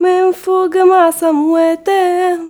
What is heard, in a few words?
GIRL, GIRLVOICE, Arabian Traditions